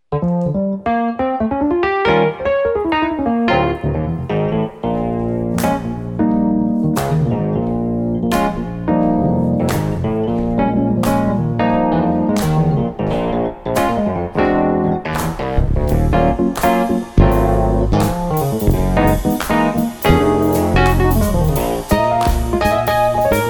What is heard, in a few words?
Wurli; electric; pianoteq8